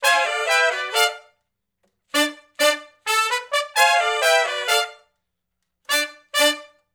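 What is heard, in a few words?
R&B Riff (D) harmony to unison, Horncraft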